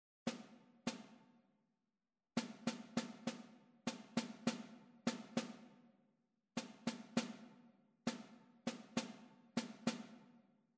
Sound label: rhythmic error detection